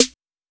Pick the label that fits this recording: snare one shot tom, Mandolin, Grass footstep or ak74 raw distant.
snare one shot tom